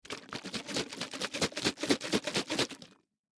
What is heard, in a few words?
Liquid Shake Container Half Empty Wiggle Slosh Hard; Splash; Water; Objects; Blastwave